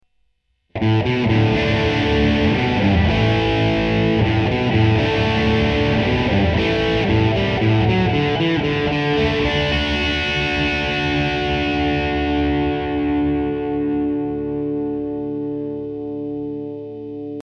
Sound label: disto reverb, guitare